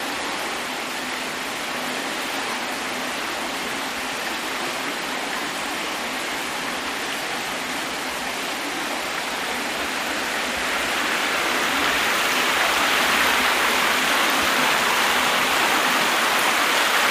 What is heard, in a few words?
Fountain Spanish Cl, fountains